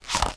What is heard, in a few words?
Paper Rub